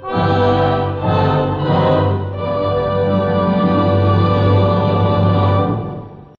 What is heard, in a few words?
triumphant fanfare